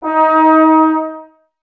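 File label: brass trombones D#, Woodwind Brass Ensemble; 90 s Sample CDs; Miroslav Vitous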